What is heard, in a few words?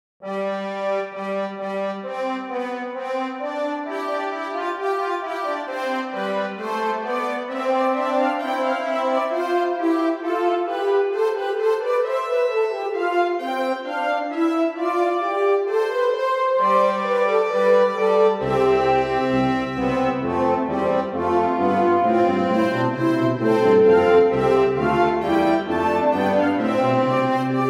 brass sect; AB Sinfonia; GPO; SAM